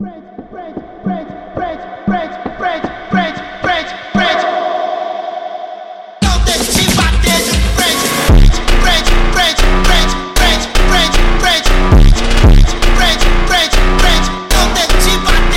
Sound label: Phonk Music; فانک phonk